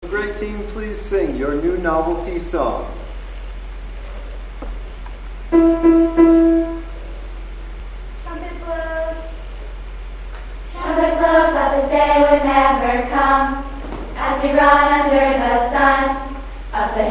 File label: trumpet blows; visiting day